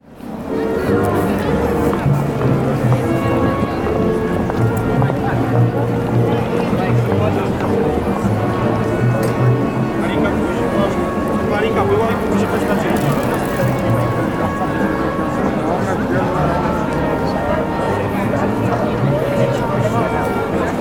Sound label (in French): Trompette Cracovie